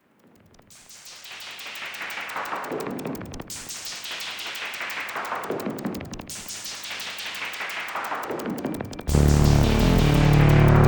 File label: MS2000; MS2000 MK5